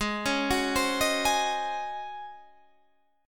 Db Major 7th Suspended 2nd Suspended 4th, Ab, guitar